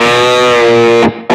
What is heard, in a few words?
x x x x x 6 bend half release; osu